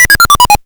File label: Hard PCM Chip; chiptune